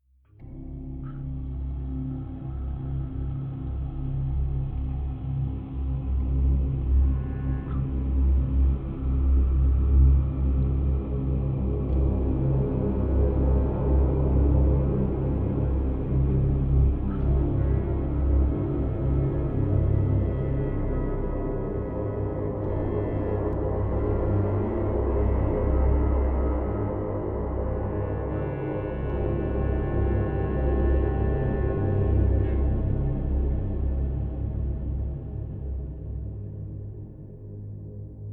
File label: darker ambient in scandinavian forest